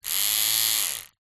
facial hair trimmer with long hair attachment run very short no cutting